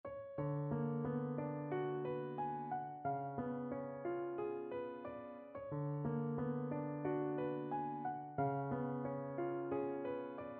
schumann dichterliebe, Im wunderschonen Monat Mai, No. 1 from Dichterliebe, Op.48 for Viola